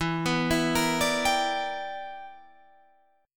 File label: E Minor 7th Sharp 11th E; arpeggiated